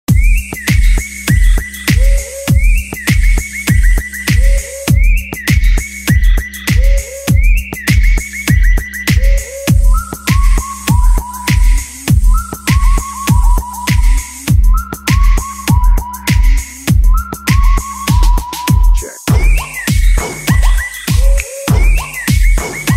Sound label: trance sad